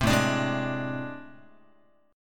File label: A-Minor Major 11th Guitar, AmM11 chord; strummed; JGuitar